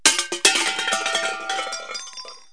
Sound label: Small Metal Pieces Dropping; 1,000 Sounds; City Life; Explosions